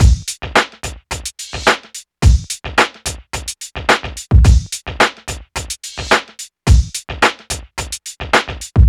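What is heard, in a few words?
Hip Hop Beat, right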